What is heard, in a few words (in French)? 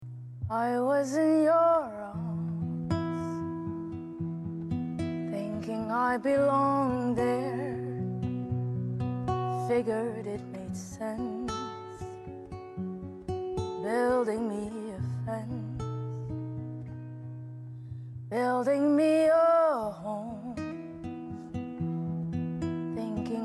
voix seule